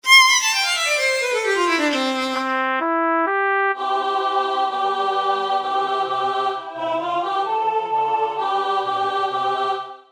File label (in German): Allegro2 Alt; ReginaCoeli